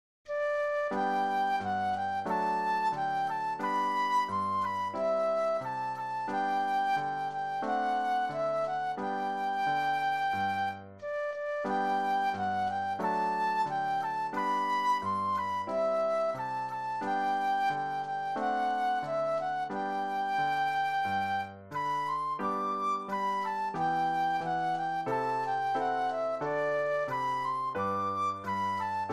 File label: flute solo